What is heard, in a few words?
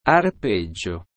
arpeggio, ukulele